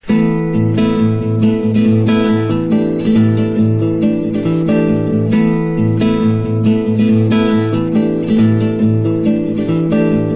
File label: guitar alone